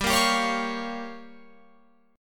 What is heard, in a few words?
G Minor 7th Flat 5th, Guitar Open F; Gm7b5; strummed